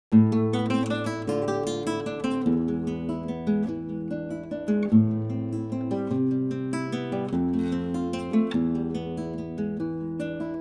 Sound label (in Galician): poema euforia cantada